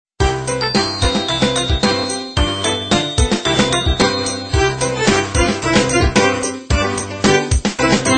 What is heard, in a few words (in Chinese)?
ping guo iphone5 zi dai ling sheng